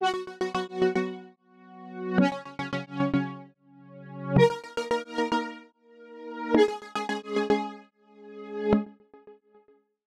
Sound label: 8bit